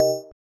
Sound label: BELL